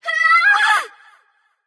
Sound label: battlecry light; smite; voicepack; hel